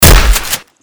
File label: Shotgun